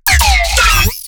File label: glitch stab; Virtual Riot; Glitch Stabs